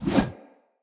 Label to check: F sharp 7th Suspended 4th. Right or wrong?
Wrong